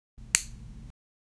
sharp tooth crackling; animal; sharp tooth crackling sou

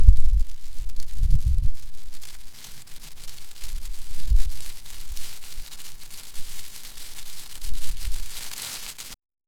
plants growth

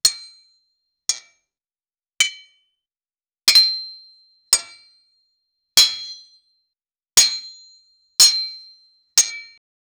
realistic sword hitting p, armor

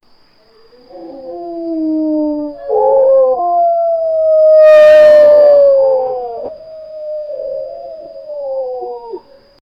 many wolves howling

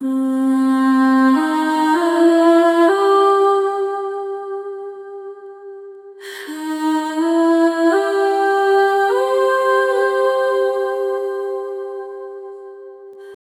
female vox melody in C; female voice

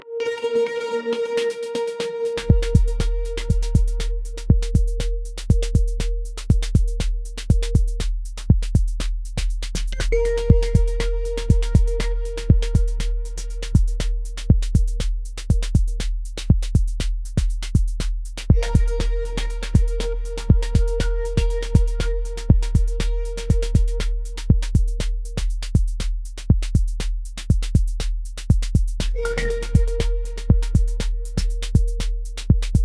40 second focus track

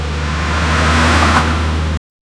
collision of car; collisions

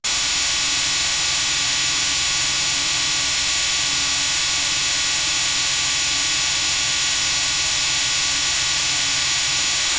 ant sound, insect

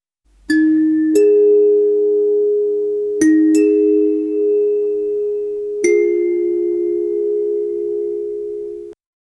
ultra realistic shamanic, handpan

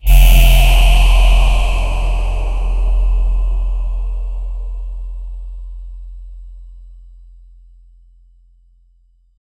ultra premium activation, angelic choir

